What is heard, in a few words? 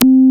BASS C3, Hip Hop; E-MU Formula 4000 Series Vol. 1; Hip Hop Nation